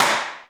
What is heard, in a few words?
SLAP, DrumBase, KITS; Crypton - DrumBase5000; BABY KITS